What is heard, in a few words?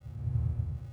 deep rumble; ambience; Soldier Of Fortune II Double Helix; XBOX; kamchatka